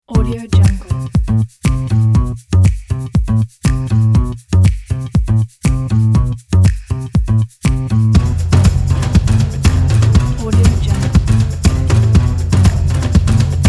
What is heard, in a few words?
breakbeat funk; music cocktail